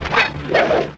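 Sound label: change weapon; 5element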